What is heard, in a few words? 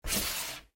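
video camera movement effect sounds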